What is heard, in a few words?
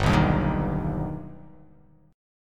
G Augmented G, chord; G+ strummed; JGuitar